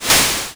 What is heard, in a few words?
fish; opensurge